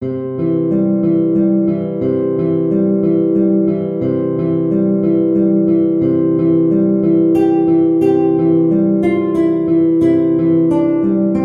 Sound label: guitar